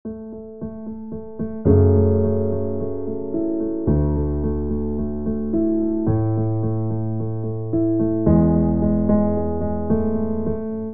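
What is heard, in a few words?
piano with filter; Sweetwater Creating Great Sounds; creating great sounds in your daw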